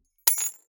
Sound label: object key key ring small drop parquet soft